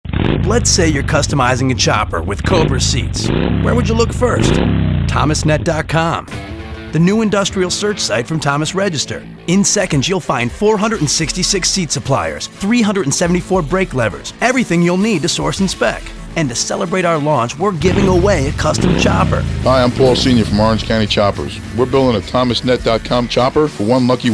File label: Chopper